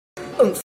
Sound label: oomf